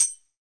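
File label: TAMBOURINE, R-8 DRUMS; Drum Mashines; EdgeSounds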